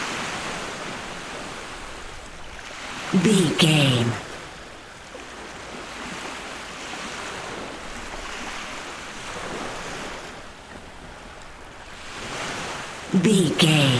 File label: beach waves ocean calm loop